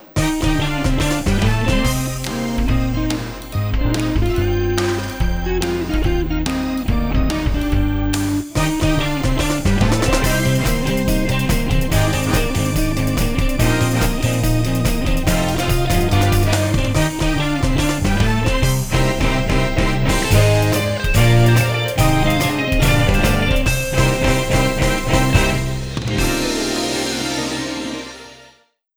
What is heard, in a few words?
Big Band Bar